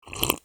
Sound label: sip; GameVsJam